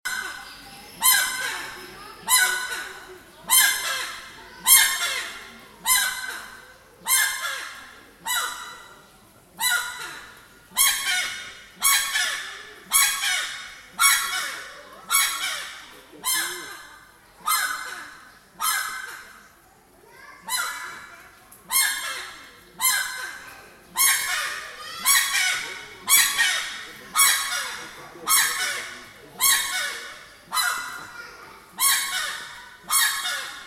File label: some bird shouting